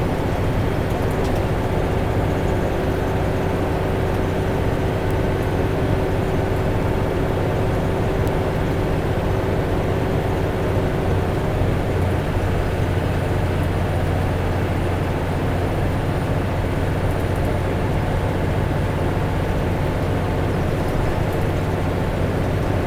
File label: DRIVE CABIN; SIMUZENSOUND